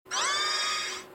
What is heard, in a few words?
Item Pickup